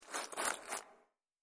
Warehouse Searching Through Garbage; Garbage Sound Effects; Foley Sound Effect

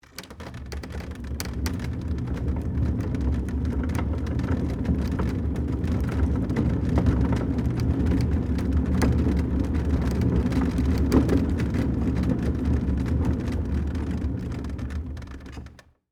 Wood Work Table, Roll, Push, Wheels, Long; Wood Sound Effects; BlastWave

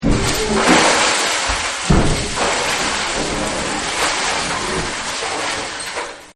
Breaking The Glass; Objects

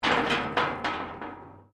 metal rusty move; Materials

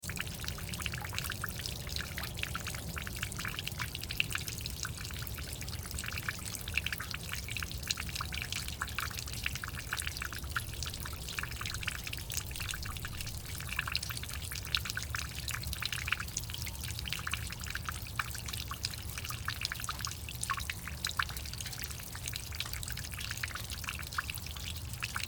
Sewer; Sewer, Running Water

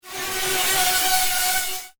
Swarm Of Metal Mosquitoes, robot sound; science fiction sound effect; star wars / trek style games

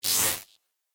Laser Static; Laser Sound; Multimedia

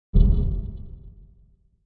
Flame; Flame sound for video editing, movements, logos, flash, or animation.; Sound Design

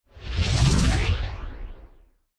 Whoosh Hi Tech Power Boost; Sound Design; Whooshes; BigRoomSound

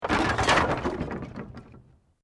Wood Collapse

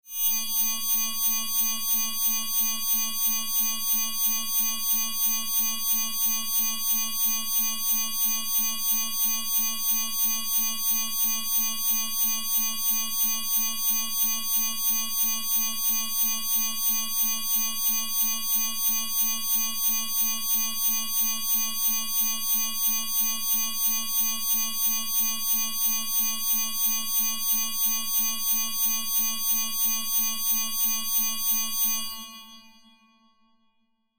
Sci Fi Alarm Battle Stations; Control Panel; Blastwave

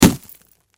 Impact Car Windshield Hit Smash Sledge Hammer; Smashes; Blastwave

